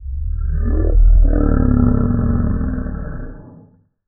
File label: Dinosaur Ankylosaurus